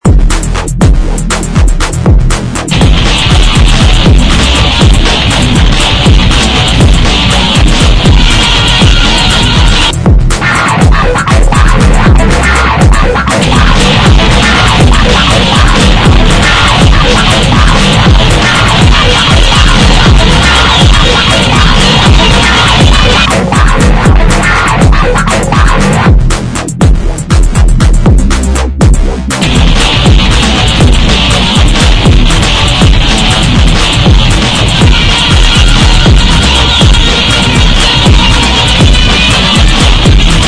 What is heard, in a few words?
Rock